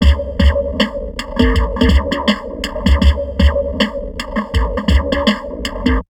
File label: DIST 80, Drum Loops Crazy Processed; USB Soundscan vol.07; AKAI